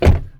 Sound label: Car Door Closing Dull